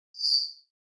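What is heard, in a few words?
HF Electronic Pulse Reverb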